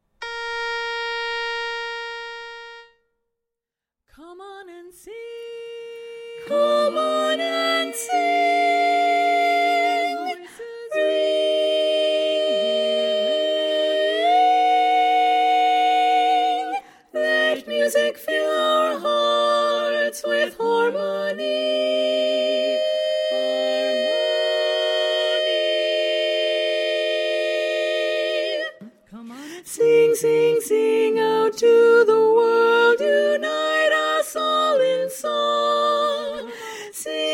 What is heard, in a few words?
tenor predominant, chorus